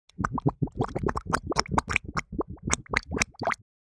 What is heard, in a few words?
Cartoon drowning bubbles